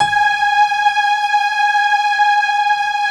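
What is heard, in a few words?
G#4, PIANO CHOIR; Best Service; Advanced Media Trax Modern Composer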